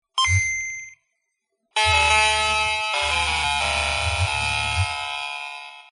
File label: start